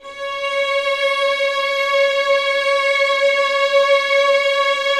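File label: 1st violins sustain c#5; Sonatina Symphonic Orchestra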